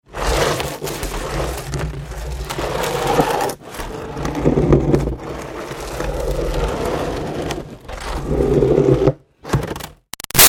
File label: Green Garbage Pail Scrape Driveway